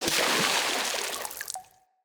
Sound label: Impact Debris Medium Water; Weapon Impacts